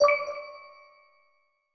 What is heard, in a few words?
chime; strider